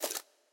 mouse in; Rescue Team3

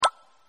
product clicked; Delicious Emilys Childhood Memories

